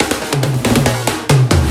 Fills, Loops 140 BPM; Vengeance - Essential Clubsounds Vol.4

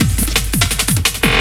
loop, 170 BPM, drum & bass

